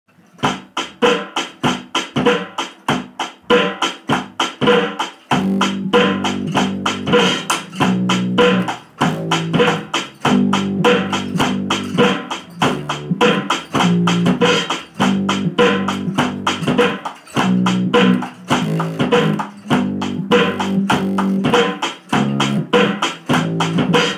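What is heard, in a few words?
drums and bass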